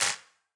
Wider Clap

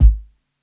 KICK SOFT; ONE SHOTS; breaks; Basement drums vol 2

